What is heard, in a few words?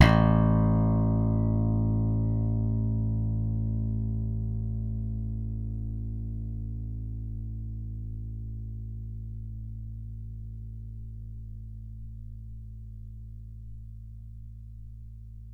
fat beam pull, bass